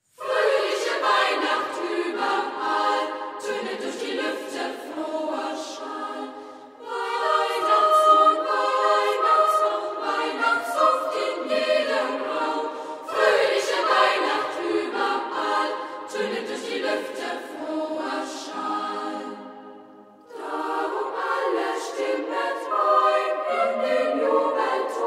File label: a cappella, Kinderchor